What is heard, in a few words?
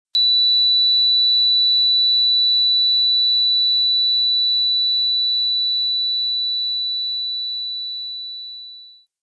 shellshock ring; dod